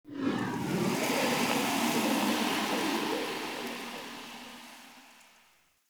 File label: Wind Gust Calm Toxic Throat; Ambience; Misc Outdoor; Warframe; Infested Eris